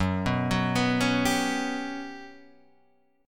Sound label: G Major 7th Flat 5th Gb